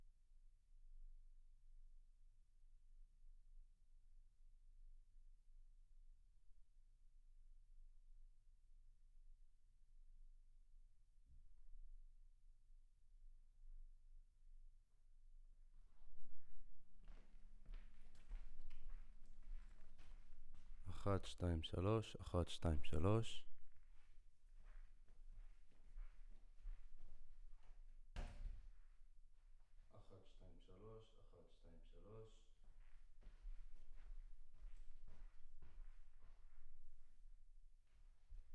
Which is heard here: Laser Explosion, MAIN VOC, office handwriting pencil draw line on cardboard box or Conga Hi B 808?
MAIN VOC